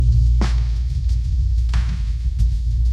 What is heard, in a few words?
notification